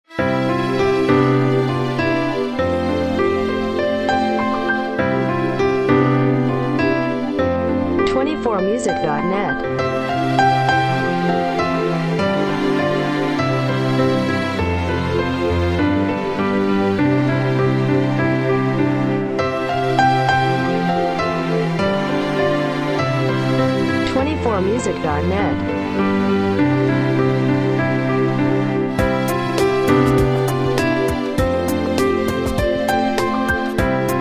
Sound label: piano lights